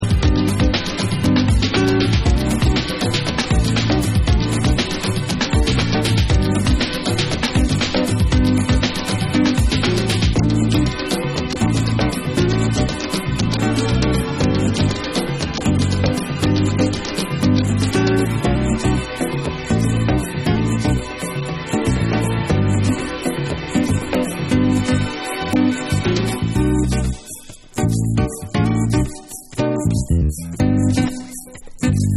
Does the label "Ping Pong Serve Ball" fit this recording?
No